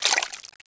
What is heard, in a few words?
slosh; XDM